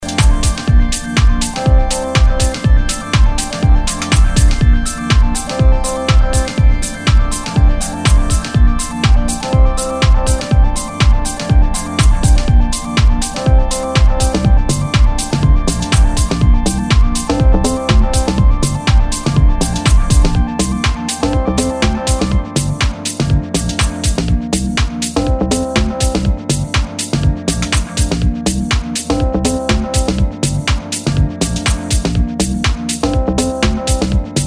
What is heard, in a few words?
Full Instrumental Mix Basic Club